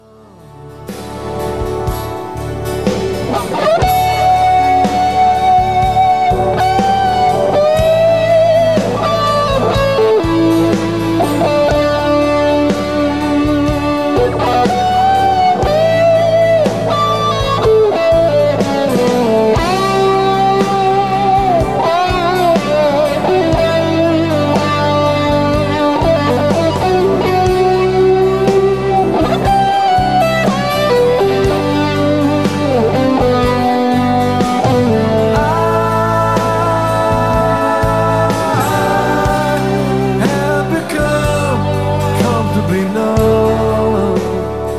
solo, soundboard; Rottrdam; tv promo

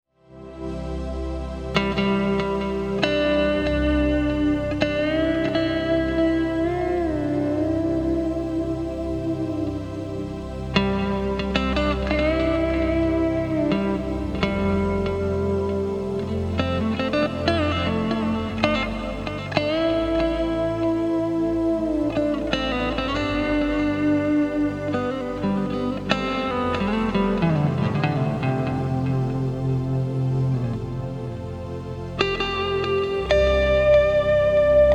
Pulse Tone, Room Reverb; Dyna; CE2B

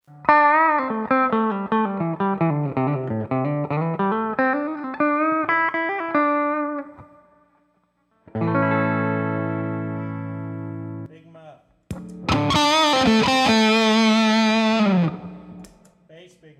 V8 Russian Muff Bass Big Muff LEADS

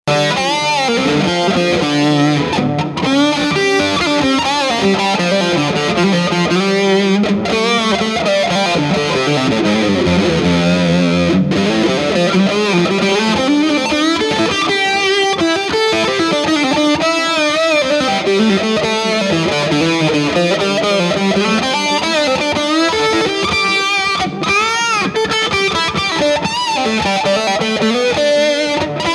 STRAT

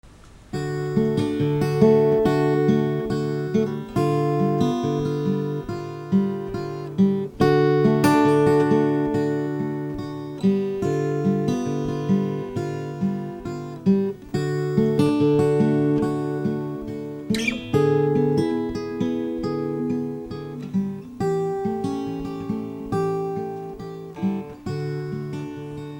acoustic

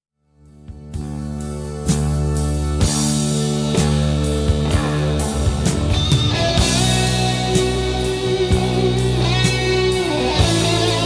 Solo, Studio track

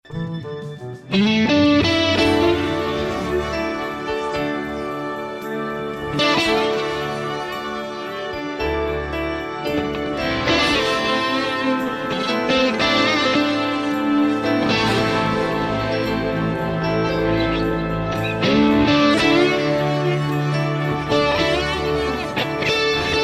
dry solo from surround mix

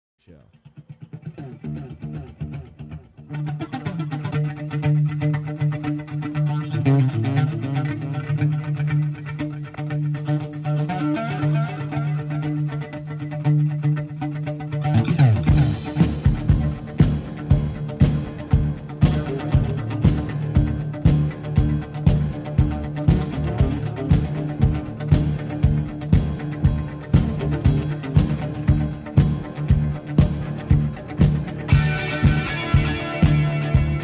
Instrumental

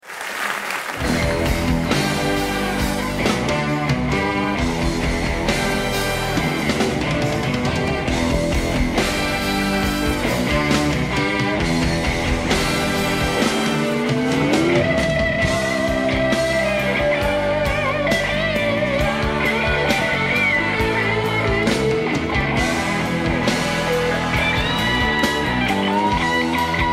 Guitar Solo

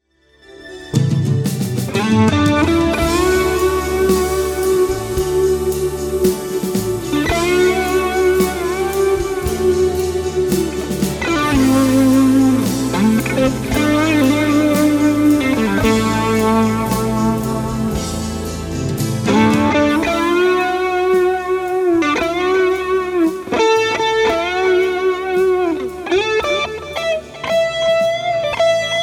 solo Twin Reverb Rat

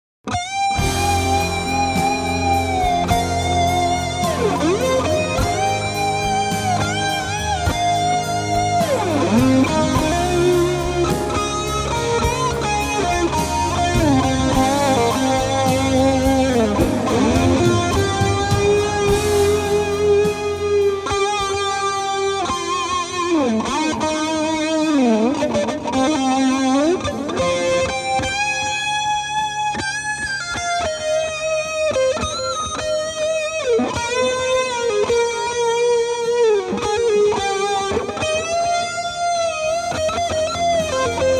solo EMG Strat Twin Reverb; GR Muff

